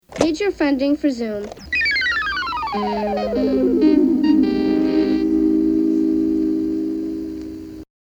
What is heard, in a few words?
Zoom Pbs Synth